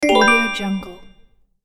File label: correct